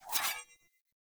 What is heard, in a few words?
Sword Accent Blade